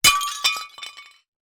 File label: plate